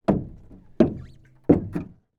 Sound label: rowing boat oars in; transportation